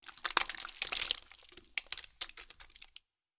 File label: Pirate Moovegore